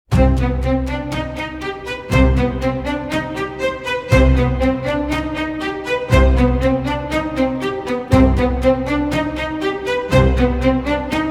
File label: Strings